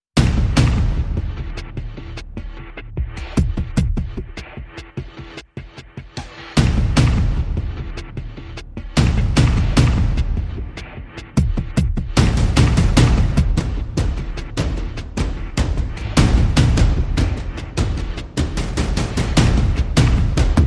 No Claps

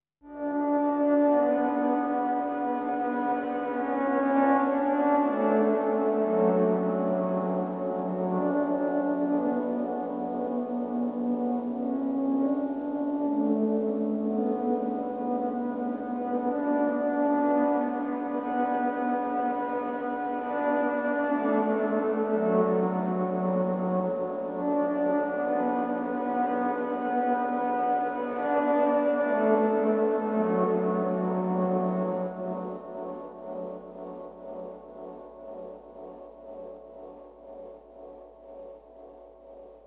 Synth Pad Only

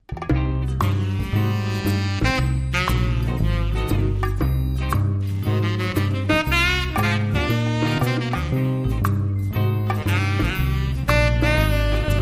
Sax Solo

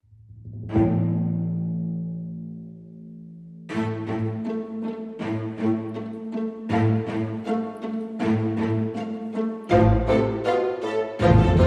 Underscore

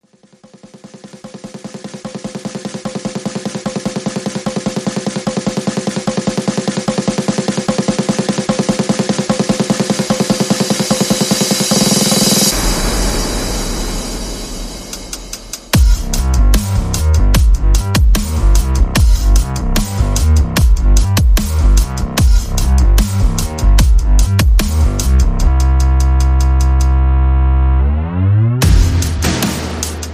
Bass and Perc

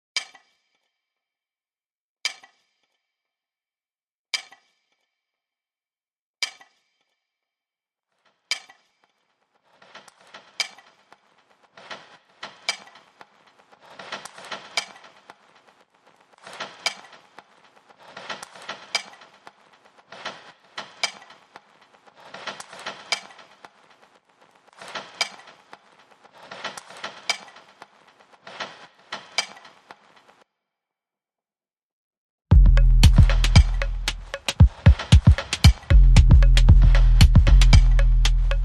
Drums and Bass

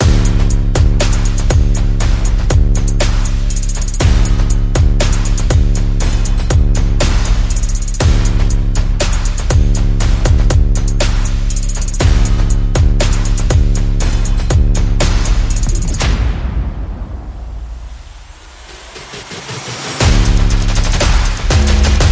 Percussion Bass and Effects

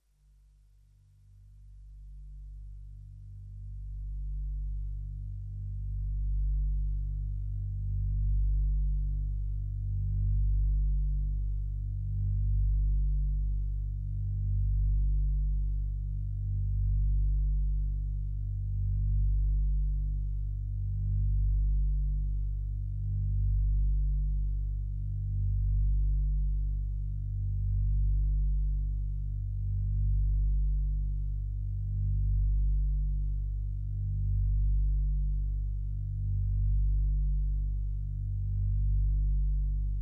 Boxes, Bass Drone

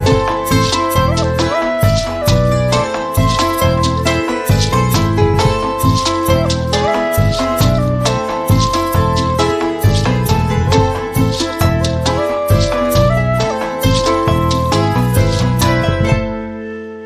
Columbian Waltz

